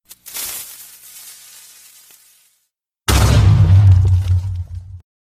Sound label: Creeper sound; minecraft